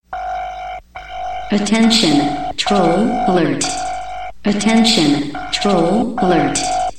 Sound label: Troll Alert